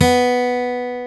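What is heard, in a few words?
acoustic guitar, country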